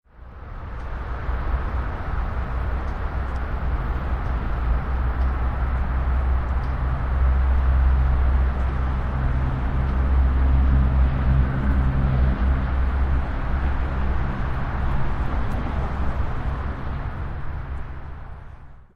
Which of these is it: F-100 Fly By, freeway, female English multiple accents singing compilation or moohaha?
freeway